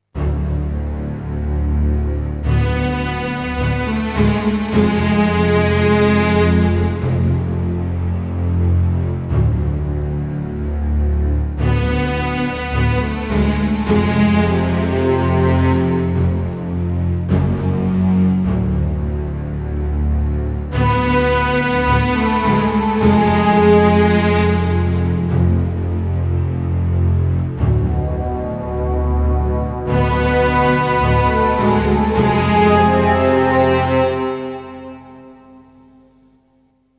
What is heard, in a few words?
Variation - Corruption (Cello); max payne 2 original soundtrack game rip